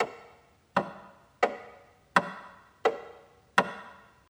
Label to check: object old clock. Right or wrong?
Right